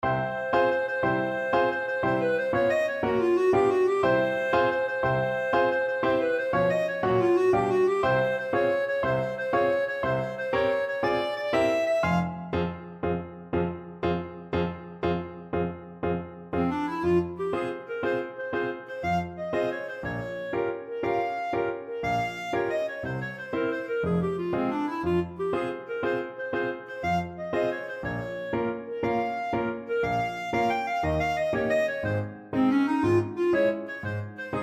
tarantelle, clarinet; gottschalk

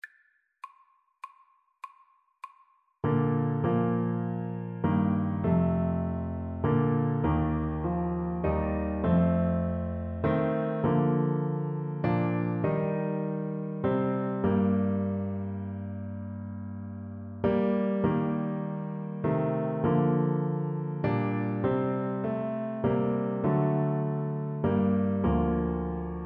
Trumpet; great creator

